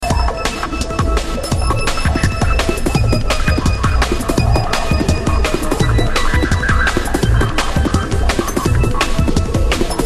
Horrific Tone

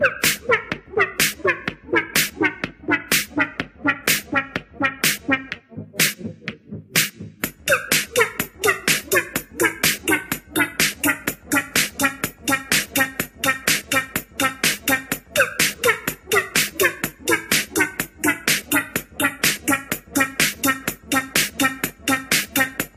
Minimal beatz

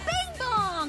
Bing bong

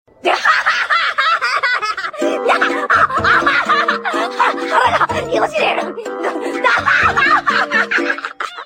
Funny Laugh; Naruto

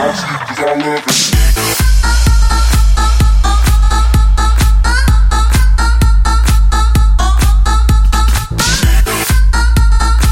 Electro Ringtone

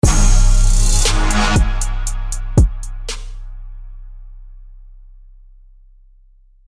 Trap ringtone

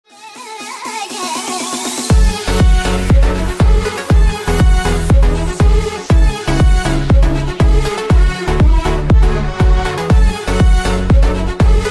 Arabic House

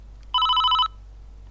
Siemens x45 Ton

